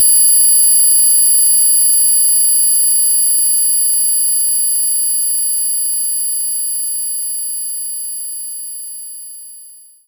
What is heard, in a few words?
Triangle (Perc); Knights Try